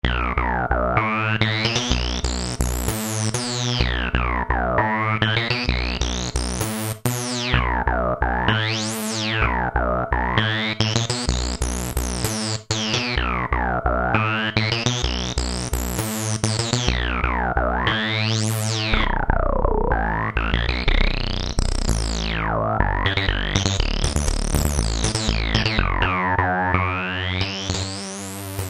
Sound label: trance mission, Kurzweil K2000; Kurzweil K2000 Contemporary ROM; Contemporary ROM Programs and Demos diskette; ANASYNTH